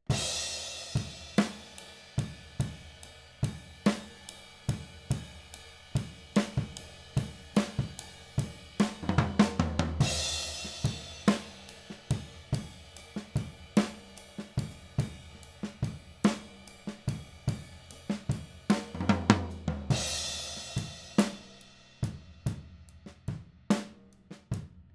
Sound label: Overheads